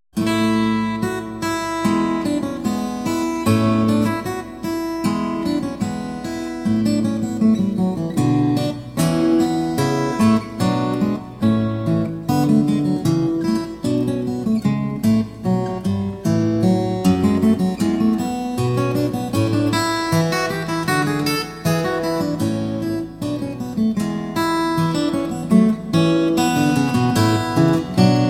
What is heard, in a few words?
Recercar 4 steel string guitar; Paul Berget; The Siena Manuscript